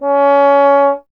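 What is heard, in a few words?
bass trombone; Miroslav Vitous; Solo Instruments VOL-1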